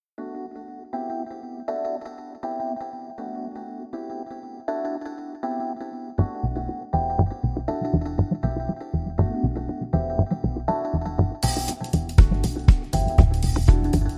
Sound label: Positive Pulse